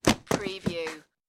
Body Fall Impact

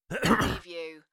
Male Cough; beanstalk audio

